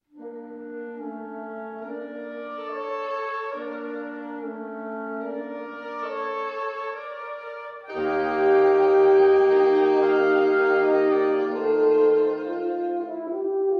Quintet; in Bb Major, op.100, no.6, Mvt 1 Poco adagio

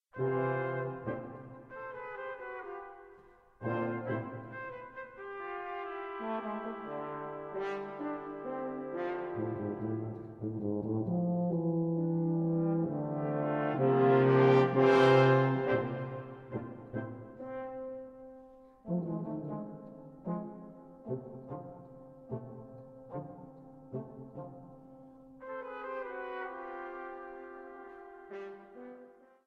Five Brass; Four Movements for Five Brass

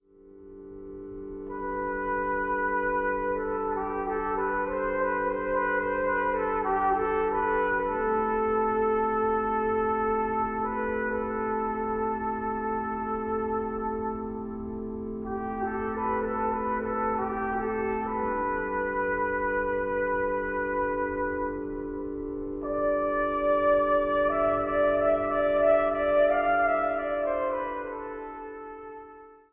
Trumpet and Organ; Hovhaness: Sonata for Trumpet and Organ, Mvt. I (begins at / :28); CRYSTAL RECORDS

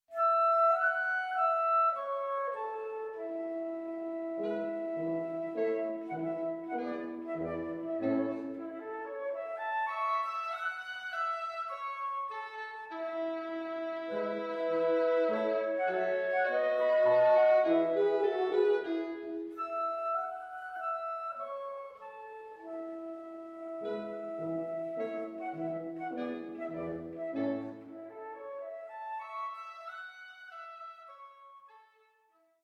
Quintet

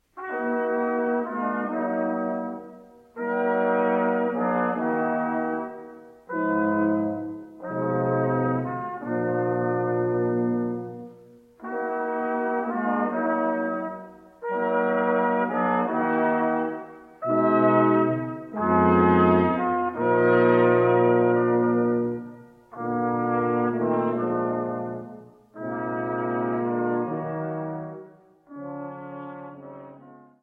Brass Quintet

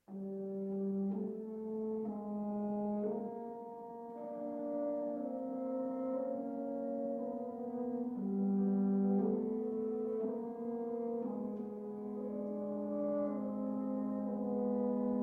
Woodwind Quintet

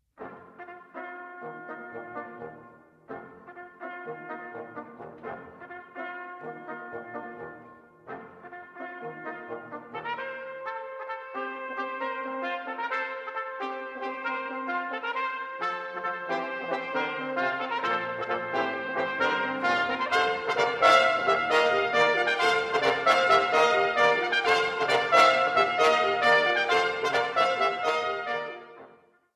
Fanfare

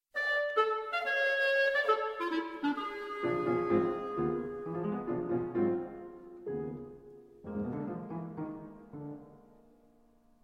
Trio for clarinet, violin & piano; Mvmt I

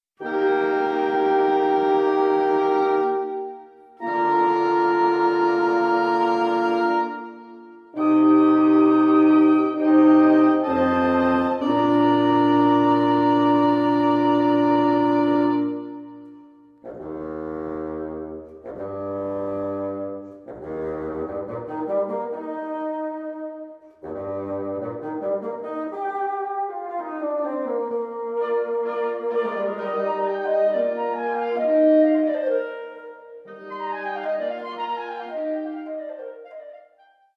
Quintet; in Eb Major, op 88, no.2

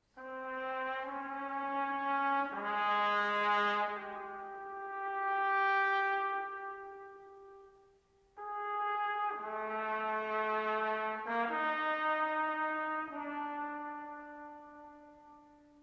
Brass Trio Slowly

